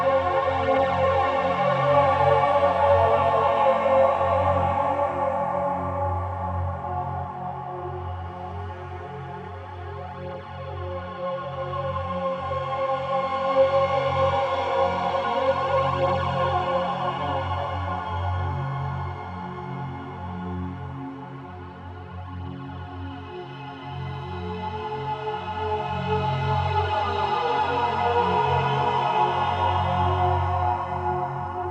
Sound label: Envoy Death Cue; Envoy Death Ghost